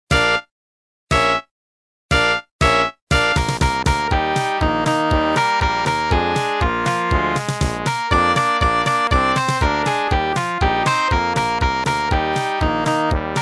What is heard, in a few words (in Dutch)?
blaaskapel